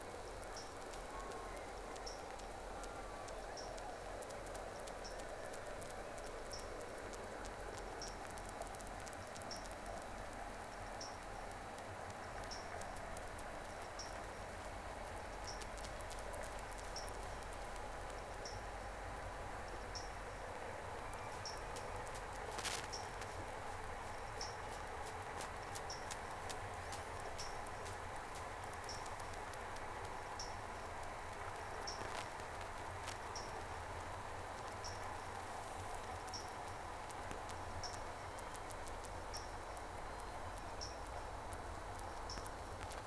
Whales; SeaSnake

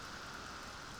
Background Noise

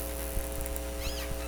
call S6 K pod; Sea Sounds

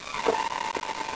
Sea Sounds

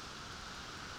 Background Noise

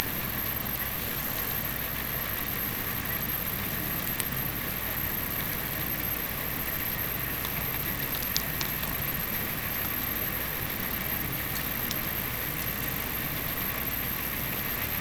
Hydrophones, orcasound

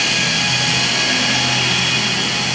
Speed Boat

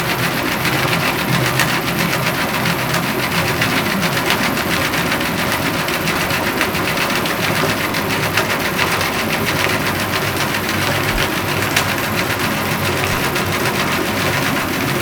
Hydrophones, orcasound

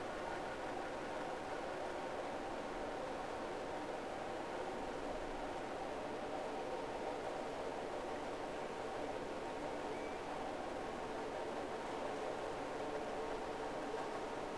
Whales; SeaSnake

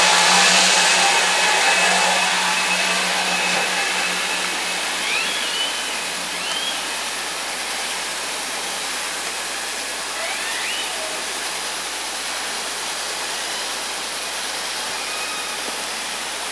Orca sound Night Passby

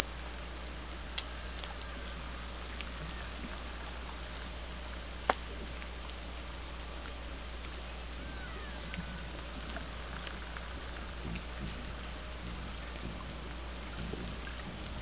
Hydrophones, orcasound

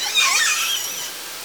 call S10 L version; Sea Sounds

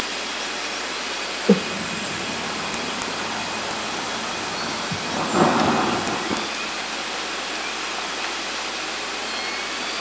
Orca sound Night Passby

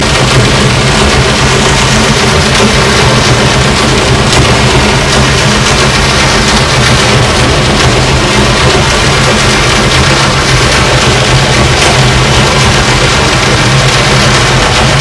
Hydrophones, orcasound

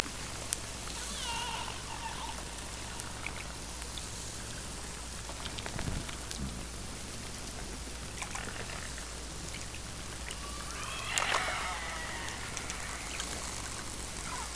Hydrophones, orcasound

